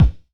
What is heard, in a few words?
Hammer All Punch, Kicks; Airwindows